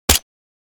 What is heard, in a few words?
MK2 assault rifle single shot; war; gfxsounds